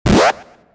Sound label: Train Boost